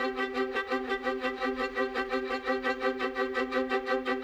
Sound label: Violin; Sonic Foundry (Sony Creative Software); Orchestral VOL-2